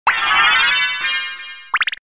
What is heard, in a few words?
level up